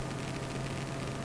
idle, weapons; bison; tf2

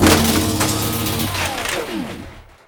sentry finish; tf2

